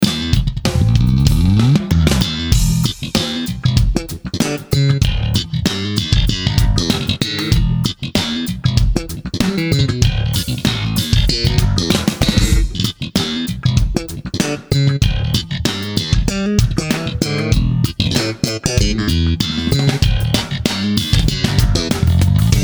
E-Bass Slap

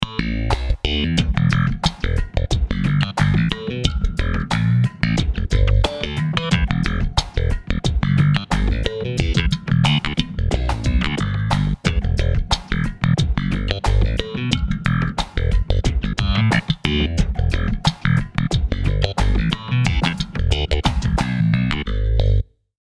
Fretless unusual

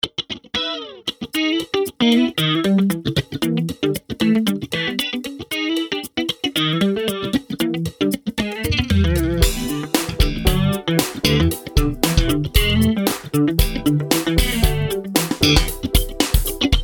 Funky Jazz Chorus, Jazz Guitar

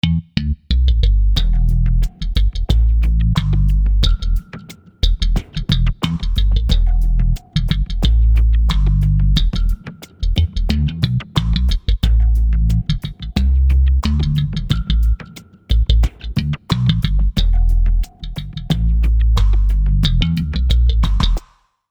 Fretless unusual

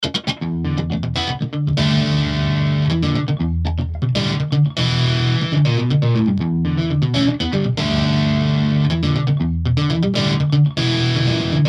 Funky Blues Solo, Jazz Guitar

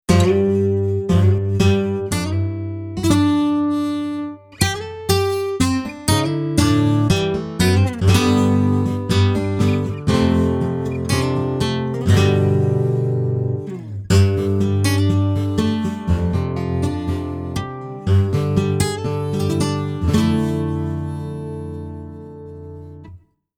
Steel Rattle

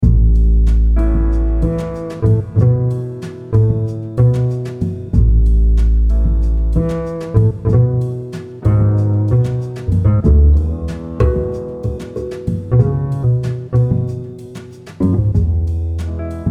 Upright Steel String

